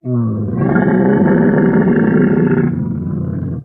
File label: Camel Groan Breath Si, camels